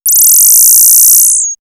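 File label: Electric Chair, electronic fx; X-Static Goldmine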